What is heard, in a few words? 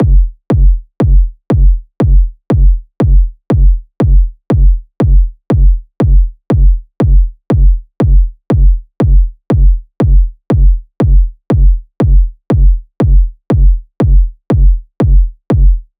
kick 120, Kick Loops; drum sample pack; Cotopaxi; SEISMIC; Slate